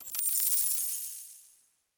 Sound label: Item Machine Buy; Josh Journey Darkness Totems; Santuario